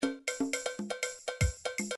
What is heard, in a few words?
latin 1bar